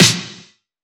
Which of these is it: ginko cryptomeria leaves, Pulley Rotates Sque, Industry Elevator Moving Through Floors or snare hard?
snare hard